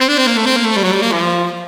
Sax Cool Lick; Sonic Foundry (Sony Creative Software); Knutrix Drum & Bass

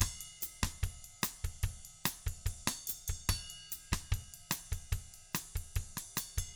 BOSSA, Drums; ILIO; Double Platinum Drums 1

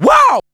WOW SHOUT; Back In Time Records; Big Bang; THE HIP

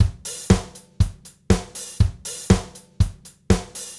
Gretsch groove 120 BPM, 1967 Gretsch Kit drums

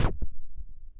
ST SCRTCH, SCRATCH

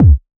OSH Kick; Big Fish Audio; Old Skool House; OSH Kits; OSH Kicks

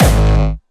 Distortion Kicks Tonal Kick G#1; Bassdrums; Vengeance; Essential Clubsounds Vol.4; Bonus TonalHS Kick

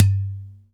Udu Hit Bass; Sonic Foundry (Sony Creative Software); Joe Vitale Latin Percussion

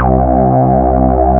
MOOG; Rubber Chicken Software Sample Bank

